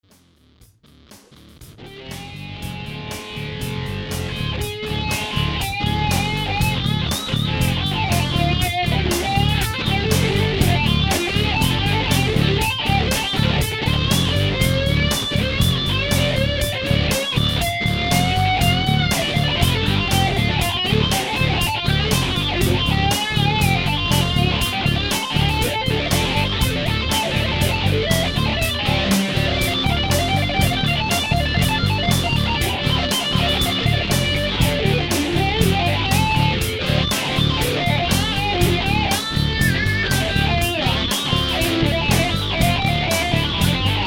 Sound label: Drop D